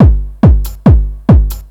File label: TECHNO BEATS 140